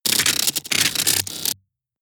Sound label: transformation rattle